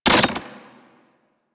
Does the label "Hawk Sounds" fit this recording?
No